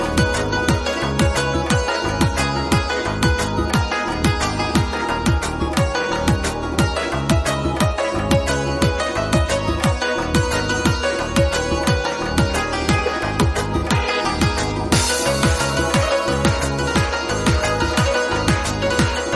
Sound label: Mobile ringtone